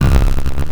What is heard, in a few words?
grind, EMU XL-7